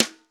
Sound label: SNR XBRUSH, Snr Brushes; Brush&Rolls